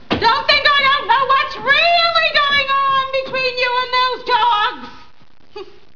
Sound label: dogs